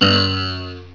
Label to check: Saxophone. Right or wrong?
Wrong